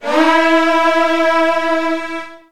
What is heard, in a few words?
E2, Virtuoso Strings; ILIO; Kirk Hunter Virtuoso Strings VOL-2